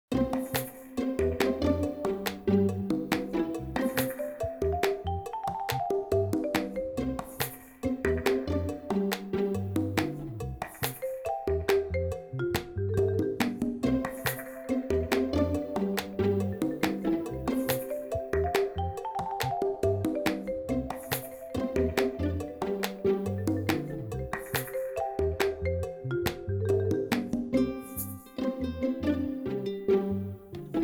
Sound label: quirky underscore; plucked; Narrative Mind